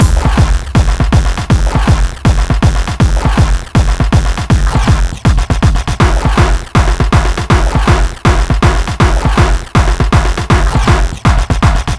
Beats Loop

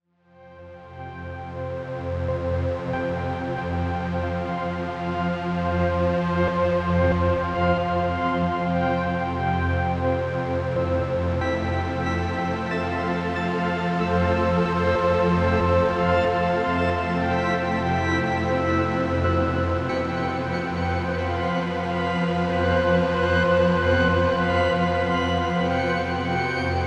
Ambient Loop; Drox Operative 2

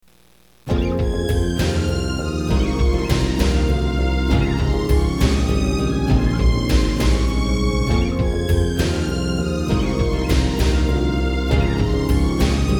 Shadow -sad blues-; Crystal Square Music Arrange Festival 2003

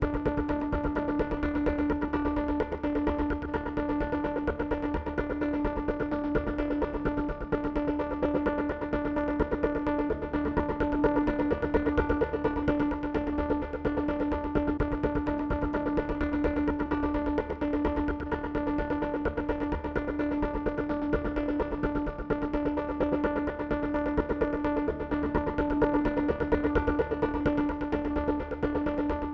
Music Bus Rpg Loop; Battlefield 3

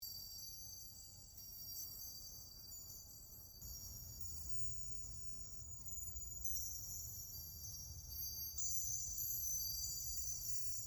Floating Symbol Ambience

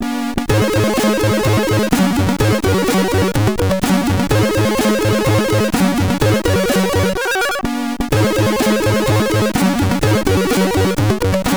The Train (SID Stereo), commodore 64; Back to the Future III

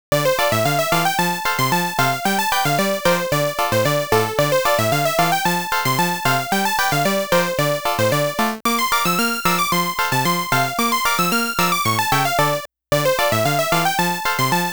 completed music; Libble Rabble; FLOWER

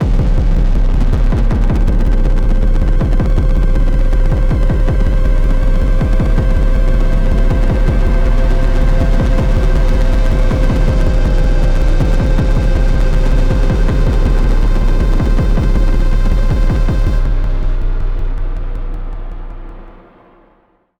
Ear Plug Amb, gamerip

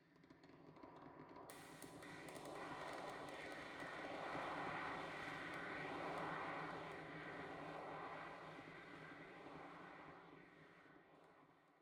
85bpm; Legends of Pegasus; windows gamerip 2012

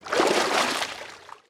Splash; Blockman Go; Android

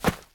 Player Jump Hit; pulling no punches

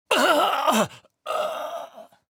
Voices male death grunt

